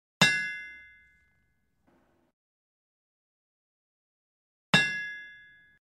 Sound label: Hammer Anvil Sound Effect